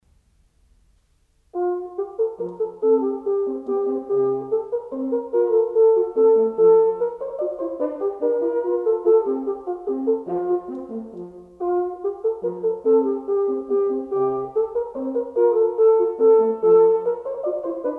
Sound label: Duos - Menuetto, Wind; Three (of 12) Duos; Mozart; Serenades and Divertimenti for Wind